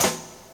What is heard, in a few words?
SD, Dance Drums